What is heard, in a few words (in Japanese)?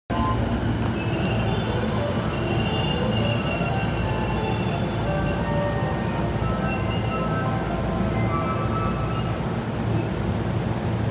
JR京浜東北線東十条南, train sounds